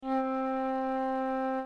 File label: Fluyte, flutes; Real Samples